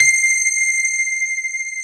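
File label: grand piano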